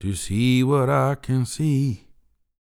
DELTA, BLUES; Blues & Soul; ILIO; Vocal Planet VOL-2

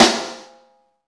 SNR, Snare; Club-50 - Foundations Roland; Ext.Snare 2

